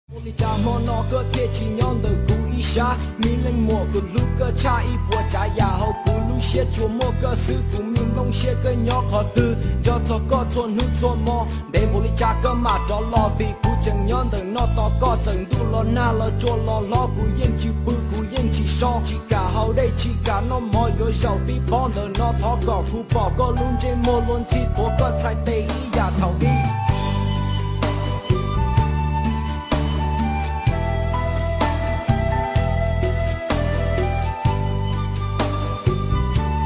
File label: hmong rap